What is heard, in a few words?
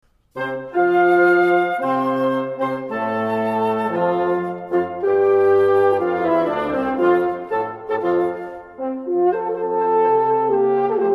Quintett Nr.3 F-Dur Allegro maestoso, corno; Profive